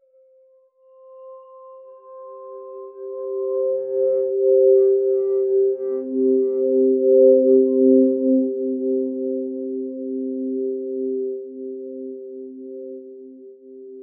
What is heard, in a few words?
relaxing calming space so, calming